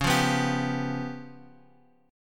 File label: Db 7th Flat 5th, Bass Standard; Db7b5 strummed; JGuitar